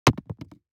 DICE on Cardboard, Throw and Roll, Foam, 2 Two Dice; Samples; ArticulatedSounds; DICE Sfx Party (Extended)